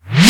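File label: reverse electronic tom; AKAI S6000 CD-ROM - Volume 1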